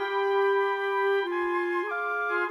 Winds, Orchestral; Sonic Foundry (Sony Creative Software) - Orchestral VOL-2; Modern 36

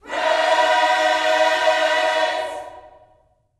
PRAISE, Gospel; Spectrasonics; Vocal Planet; GOSP PHR

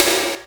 Snare, retro house samples, Drum Hits

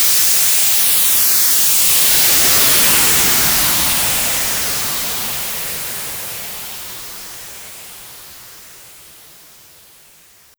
Sfx, Trance; Zero-G Trance Inducer; General Sfx

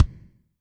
kick, Zoom MRT-3B

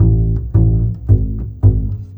Bass Pizzicato, Orchestral, Rock Pop; Sonic Foundry (Sony Creative Software); Orchestral Series VOL-4; Rock and Pop; Rock-Pop 13

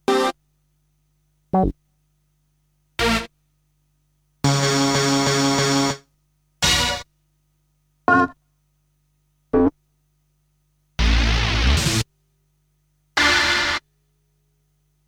Stabs; Kniteforce; 1000 Samples Volume 1

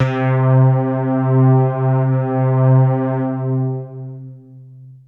Techno leads, hits and riffs

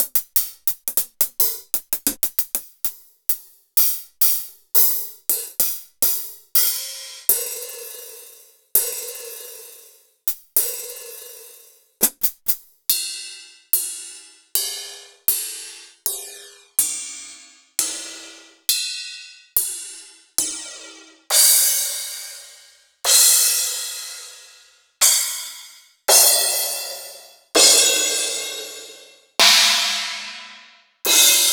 Alesis D4 Cym

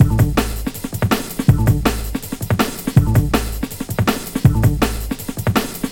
162, breaks, Loops; M8

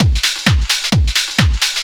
groove, DRUMLOOPS

